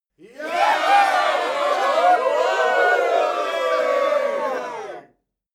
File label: cheer happy short